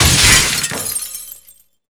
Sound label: glass crash